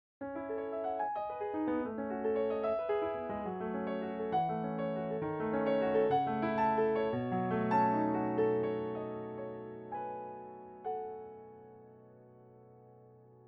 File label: Pedal; Arab